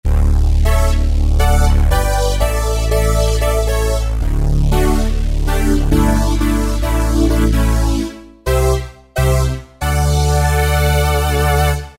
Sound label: BR Tooth, Yamaha TG500